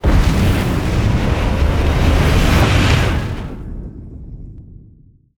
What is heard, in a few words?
near Win; netent